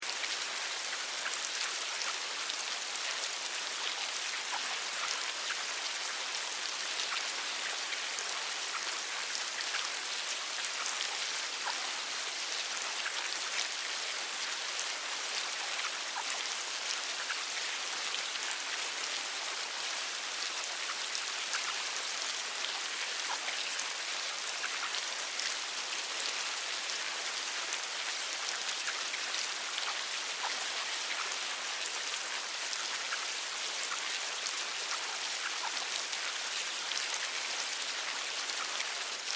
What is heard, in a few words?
Insect Larva Meal Worms Slimy Loop; Larvae; Animals:Insects